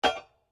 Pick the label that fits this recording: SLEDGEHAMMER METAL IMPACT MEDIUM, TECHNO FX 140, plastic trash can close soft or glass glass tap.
SLEDGEHAMMER METAL IMPACT MEDIUM